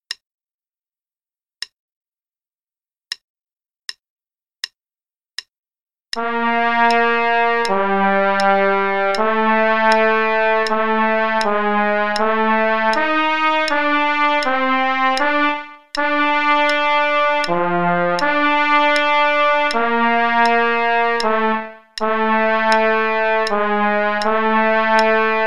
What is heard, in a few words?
click and trumpet, qn=80; Play-along; piano; Faber piano books